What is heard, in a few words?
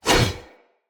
Whoosh, Swords; Weapons; Mockery; Mockery Brazard